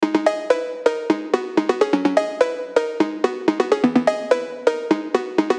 instrumental

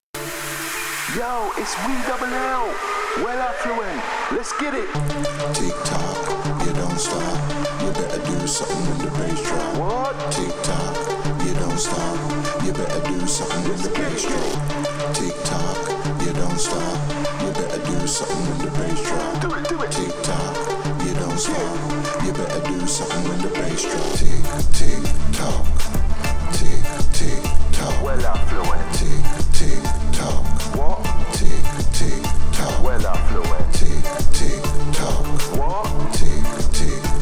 bass drop interlude

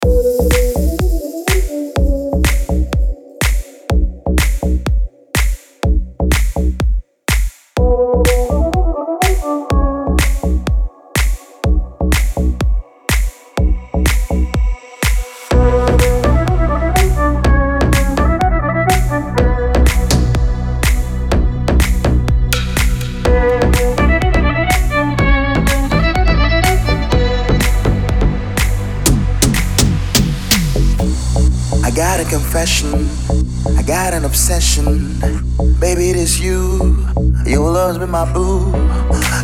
noisy duo violins

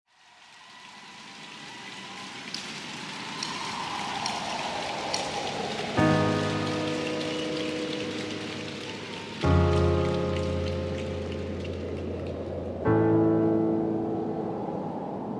acoustic live

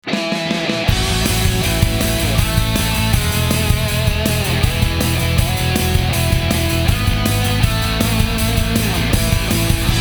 happy birthday instrumental rock version

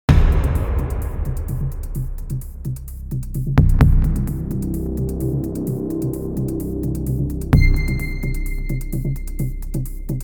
tick tock

